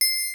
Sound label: CHIMES; Rubber Chicken Software Sample Bank